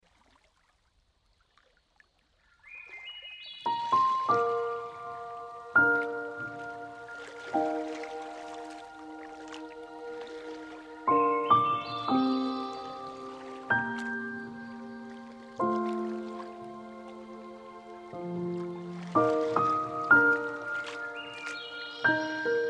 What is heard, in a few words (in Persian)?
نمونه موسیقی آرامش‌بخش; روشهای ساده مدیتیشن; دوره رهایی